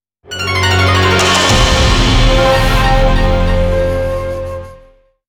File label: Sword Sheathing (game over); Akai Katana